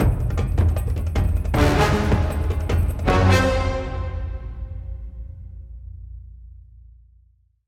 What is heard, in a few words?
Pub Brawl End tag; Upstairs